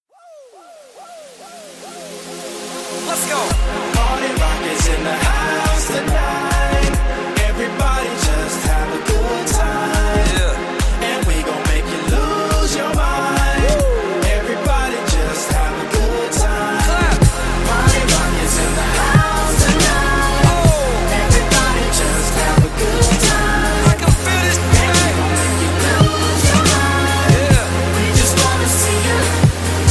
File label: Dubstep